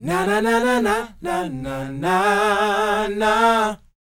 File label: NA-NA, Vocal R&B & Dance; ILIO; Vocal Planet VOL-4